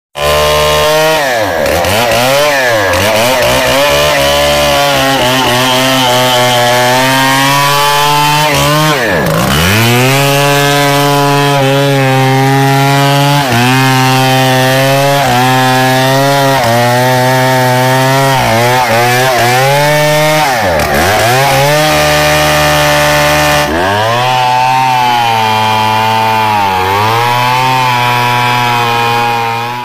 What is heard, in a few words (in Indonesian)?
nada dering alarm suara motor balap; suara motor ngebut